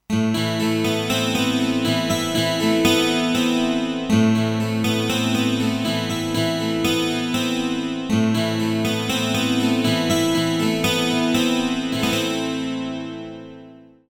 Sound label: S750 12 String